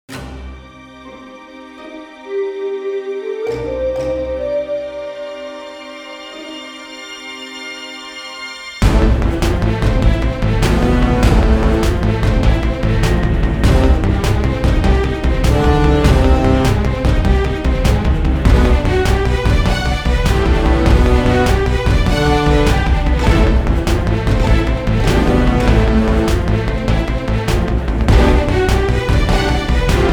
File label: Instrumental